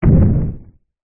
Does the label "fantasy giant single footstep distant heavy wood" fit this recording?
Yes